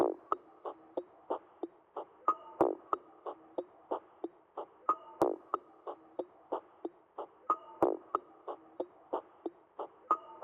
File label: Drum Loop, 92 BPM Texture; Loop Stems; Cymatics; Vibes II Drum Loop Collection; Freefall Drum Loop